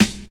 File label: solid acoustic snare sound g sharp key